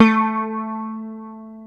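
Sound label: ELECTRIC SITAR A1; AKAI S6000 CD-ROM - Volume 1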